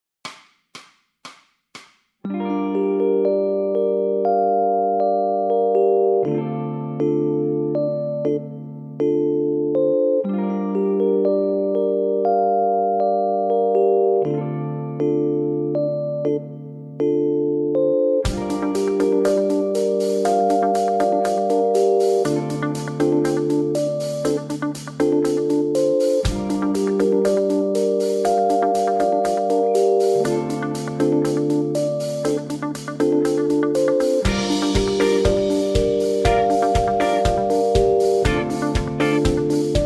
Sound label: bass blues funk, backing tracks